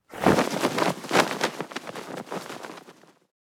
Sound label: Barber cape placement whoosh movement